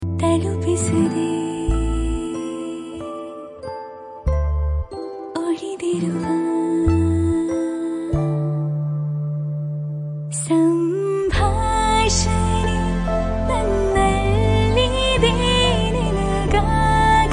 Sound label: Female Ringtone